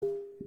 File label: nav left right